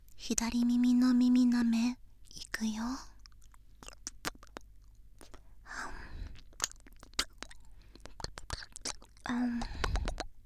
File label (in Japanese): 左耳舐め, ASMR